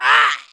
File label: hit, pain; mohaa